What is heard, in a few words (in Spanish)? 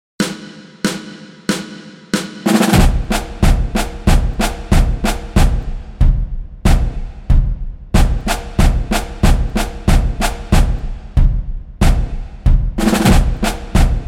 El ritmo de los tambores